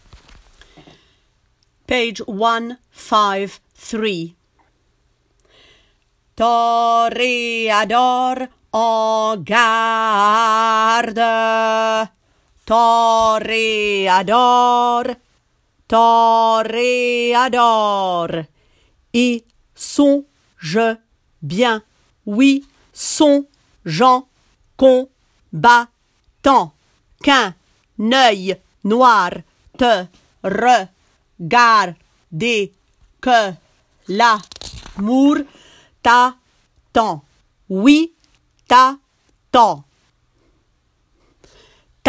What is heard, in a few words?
bas; Carmen